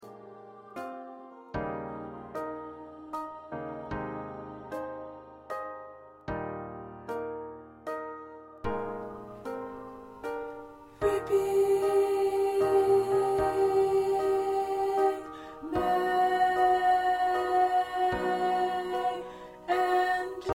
Alto, choir

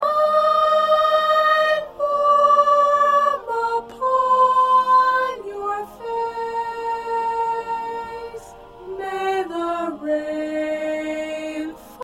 Soprano

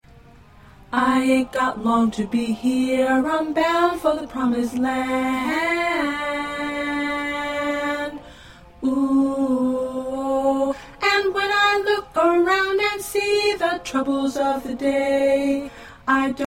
Alto 2, choir parts

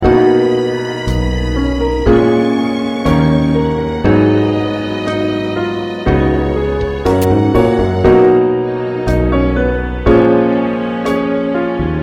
Accompaniment

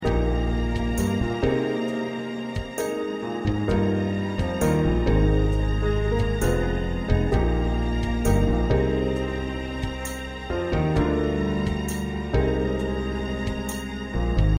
Accompaniment

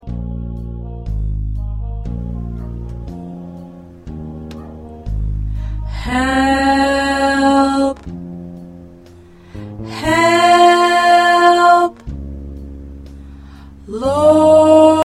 Tenor

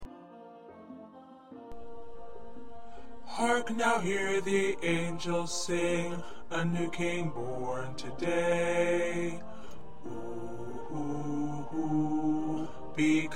Bass, choir

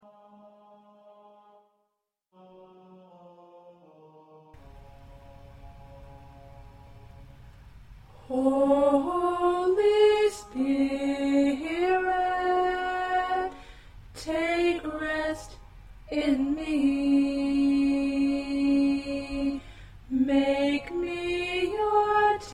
Alto

